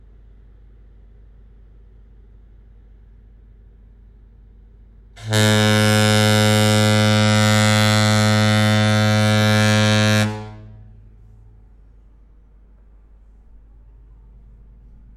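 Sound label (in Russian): туманный горн круизного лайнера; magiya cveta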